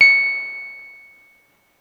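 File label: deep C#6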